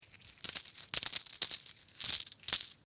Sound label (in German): Muenzen